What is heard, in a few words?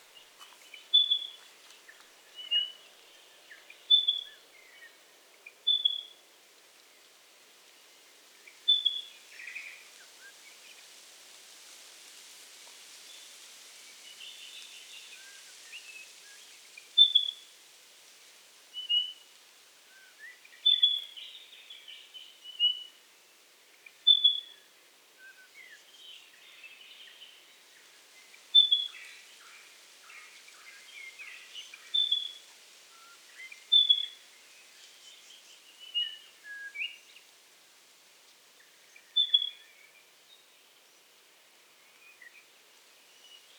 Pardalote, پرنده